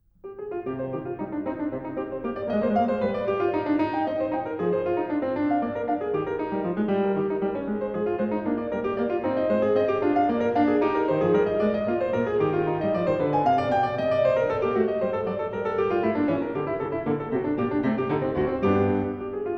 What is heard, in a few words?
French Suite #2 In C Minor BWV 813 Courante, KLAVIER; BACH